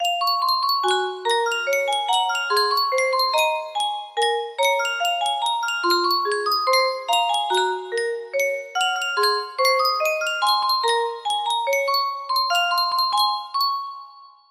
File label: music box; toilet bound hanako kun